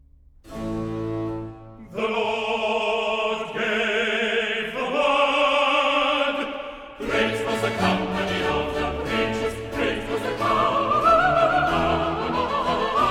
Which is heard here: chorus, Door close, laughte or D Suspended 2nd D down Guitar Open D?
chorus